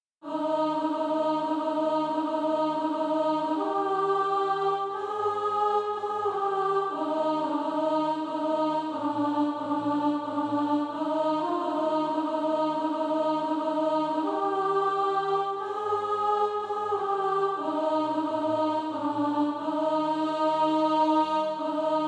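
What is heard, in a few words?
alto; A Cry from Macedonia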